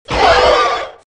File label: velociraptor - Hurt; RadwinIsland